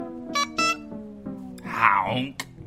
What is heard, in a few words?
Honk